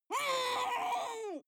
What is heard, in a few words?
water monster female gargle upset; koerpergeraeusche; comedy-cartoon; soundpool